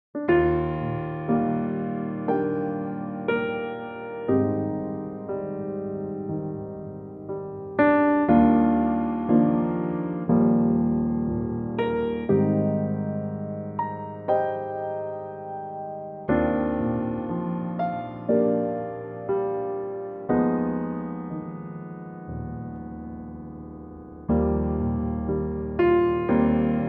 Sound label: Eb Piano; key - Eb